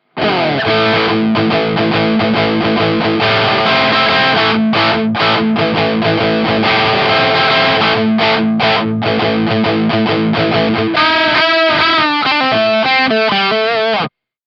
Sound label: crunch boost; kammer tiny k test